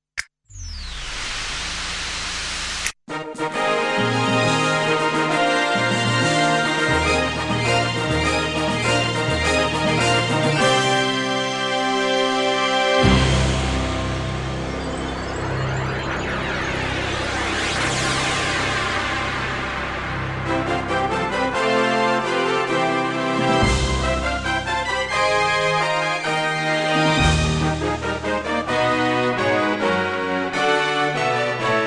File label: Korg 01W